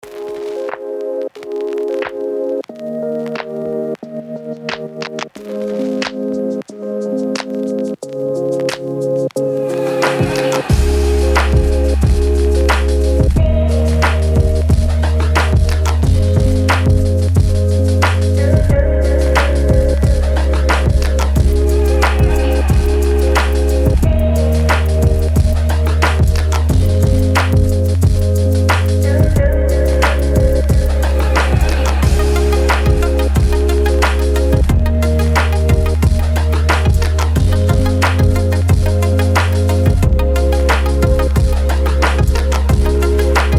lofi hip hop

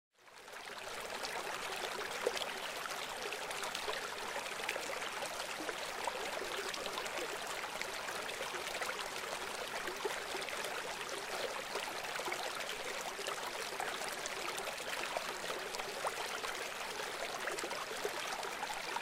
River Sound Effects

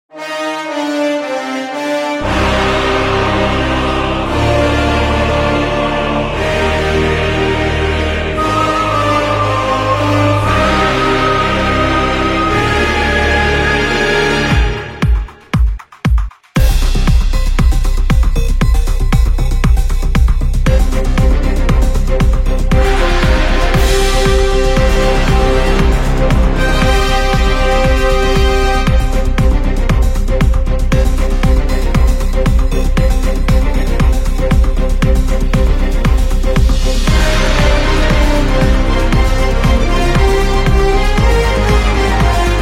EPIC VERSION

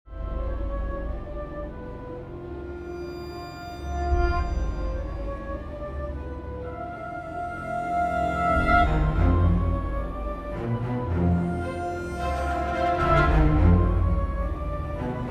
horror background music

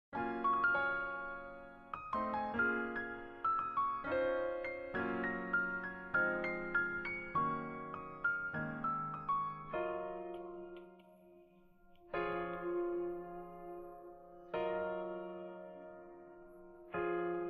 Instrumental; Jujutsu Kaisen; Ending 2

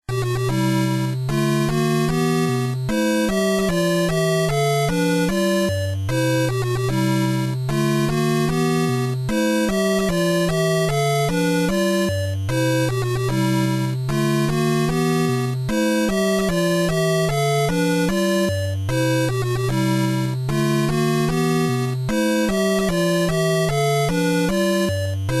fanfare; super mario bros ending

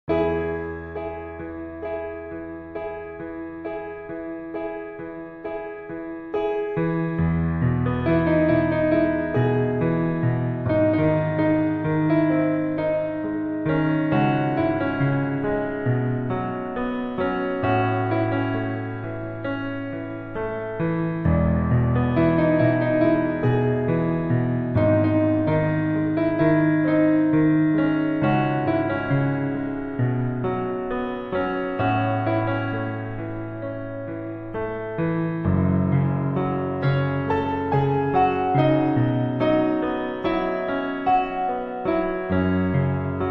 piano cover

